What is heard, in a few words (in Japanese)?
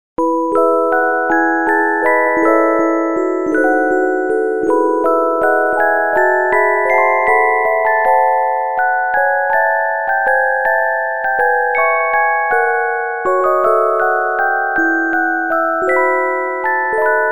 レトロケータイ着メロオルゴール